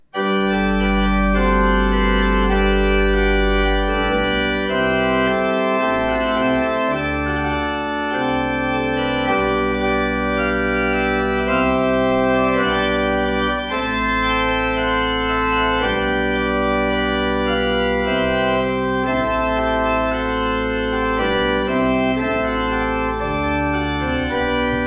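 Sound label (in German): Schuke Berlin, pfeifenorgeln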